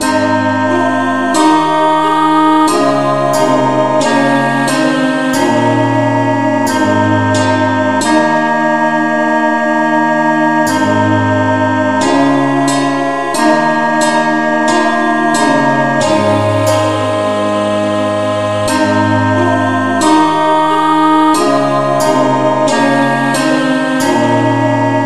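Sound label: no vocal key A flat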